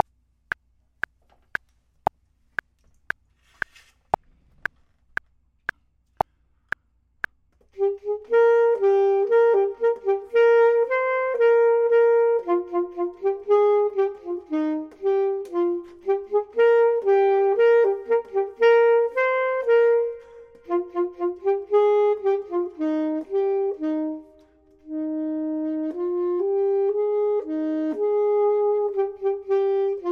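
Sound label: top, Saxophone and Clarinet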